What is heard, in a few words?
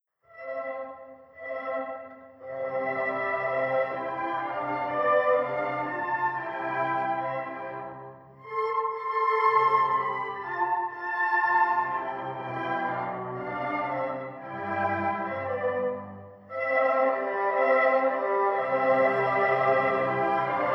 music 5m conv